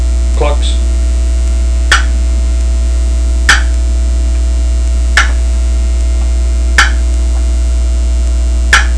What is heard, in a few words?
sidewinder clucks, turkey; Southland